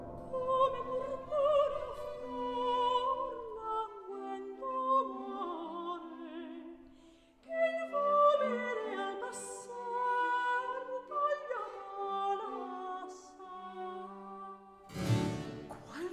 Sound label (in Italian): Canzon e recitativo; Scena 5; Angelica, Orlando, Alcina, Ruggiero, Bradamante; Antonio Vivaldi (1678 - 1741); Vivaldi - Orlando furioso (2004); Atto III